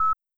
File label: SFX Enviro Beep; this is the zodiac speaking 2020 gamerip switch ps4 xbox one pc macos